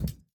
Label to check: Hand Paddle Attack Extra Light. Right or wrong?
Right